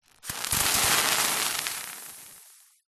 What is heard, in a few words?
horror acid burn; zapsplat